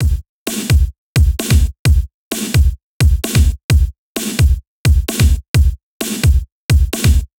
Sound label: kick snare